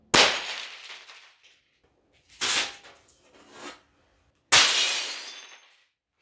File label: Glass breaker